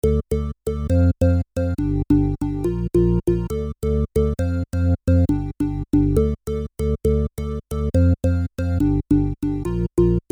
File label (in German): Reface YC Hausorgel